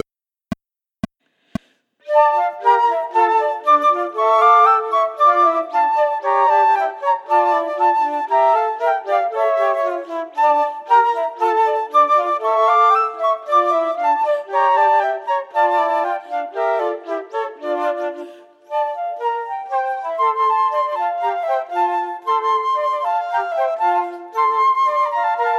Quartet, minus 3rd part; Rameau

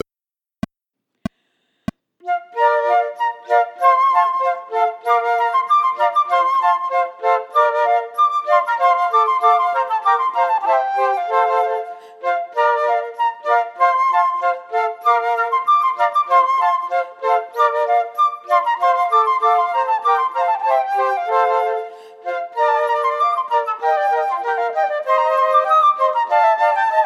Gavotta minus 4th part; Corelli

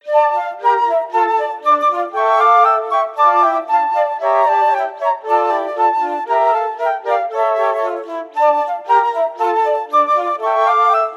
Quartet, complete version; Rameau

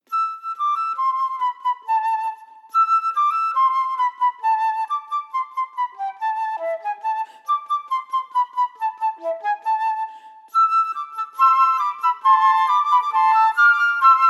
Quartet complete version; Rimsky Korsakoff

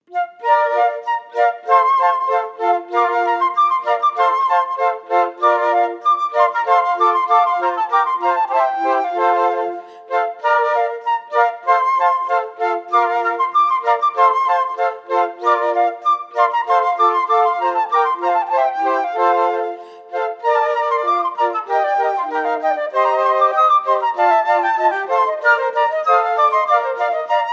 Gavotta; Corelli

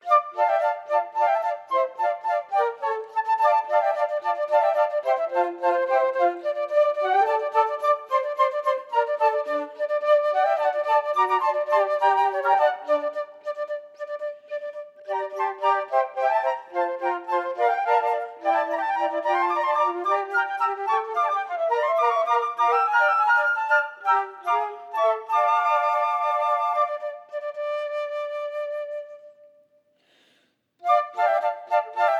Quartet complete version; Dvorak

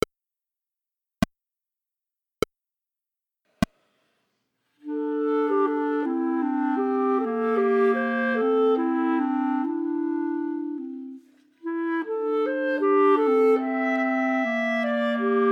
clarinets minus 3rd part

